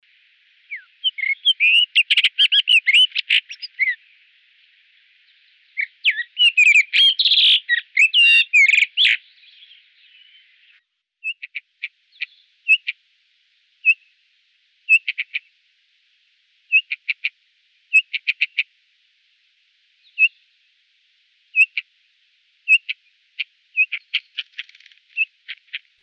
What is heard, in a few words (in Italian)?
codirossone Monticola saxatilis; Versi Animali; Il Forum della Fotografia Naturalistica